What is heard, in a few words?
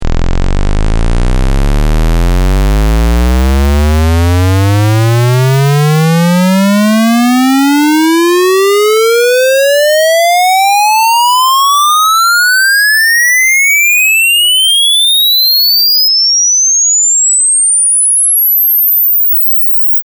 square lin 20 20k